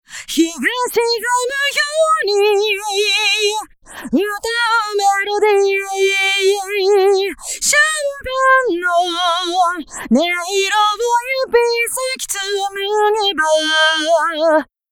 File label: phaser depth high